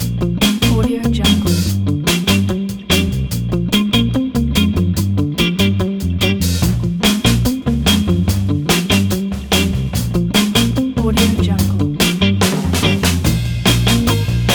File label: funk soul loop pack